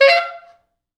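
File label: tenor sax noise fx; East West; Quantum Leap Horns Sax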